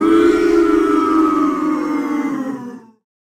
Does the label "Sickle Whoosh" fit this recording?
No